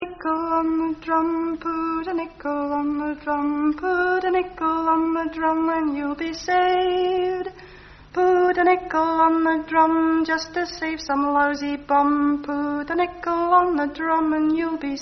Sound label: Put A Nickel On The Drum (Parody, Salvation Army Song)